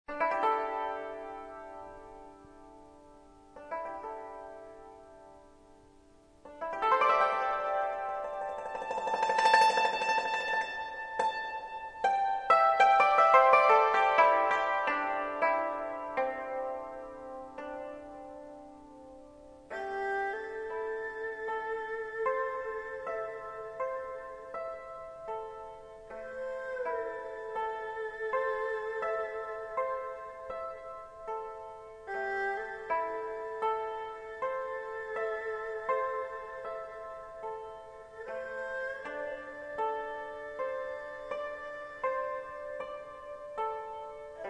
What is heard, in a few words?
string